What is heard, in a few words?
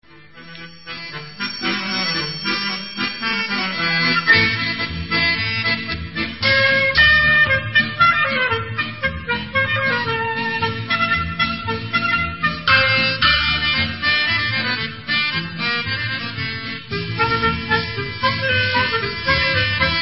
Debka, Instrumental; Debka Daluna Banot Alenu